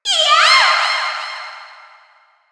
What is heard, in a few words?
knockout; SSBU; zelda